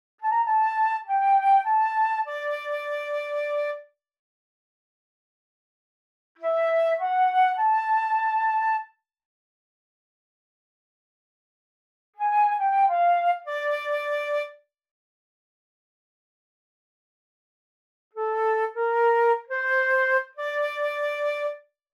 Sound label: Flute